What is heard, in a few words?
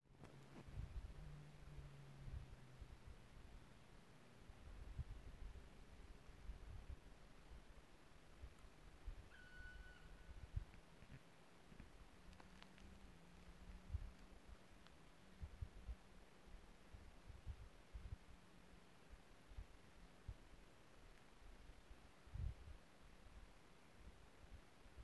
bird